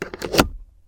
cigarette lighter; car

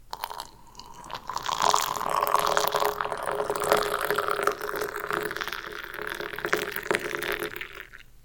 instant drink; eating

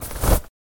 tissue

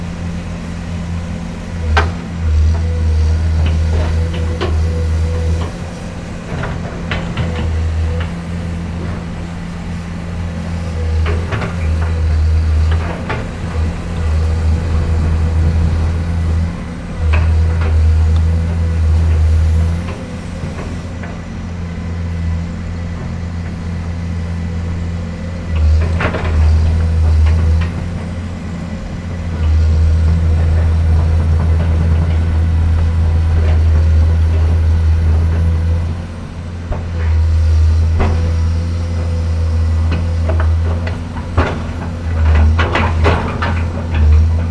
river dredging

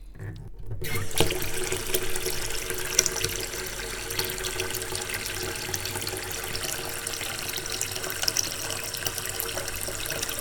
filling washbasin, 洗面台にお湯を張る; wetarea

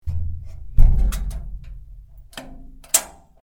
close metal door

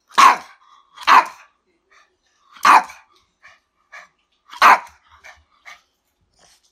give me food, animals

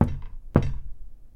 knock on glass door; knocking

